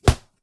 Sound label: Punching Percussion, Stick Hit, raw; 99Sounds